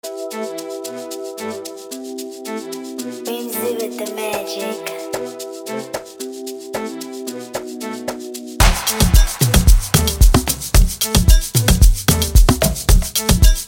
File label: Amapiano Instrumental